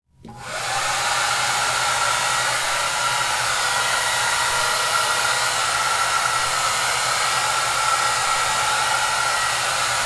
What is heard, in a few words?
Hair Blow Dryer